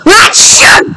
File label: sneeze; meme